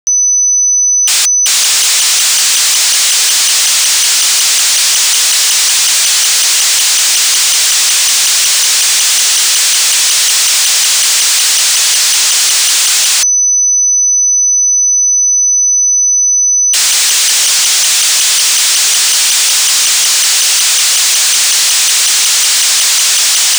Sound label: golden master pedal